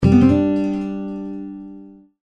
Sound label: Notification, Six String; HTC